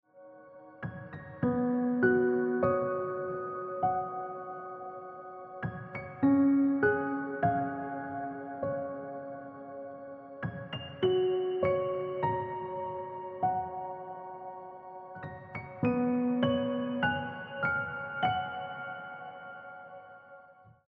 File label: Piano Music